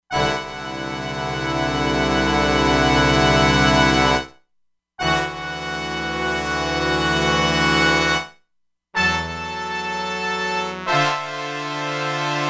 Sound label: Sfz Brass, E-mu Proteus-2; Factory Presets